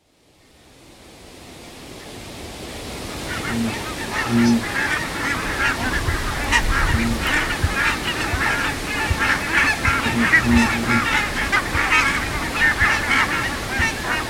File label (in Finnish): kaulushaikara; linnut